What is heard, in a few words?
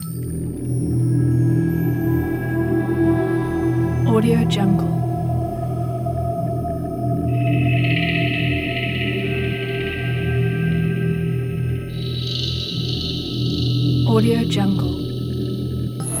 dark atmospheric background